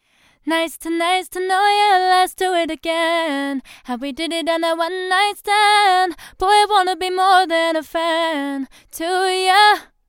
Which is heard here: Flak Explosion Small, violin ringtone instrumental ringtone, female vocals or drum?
female vocals